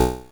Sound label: Drums, Hits; DCM8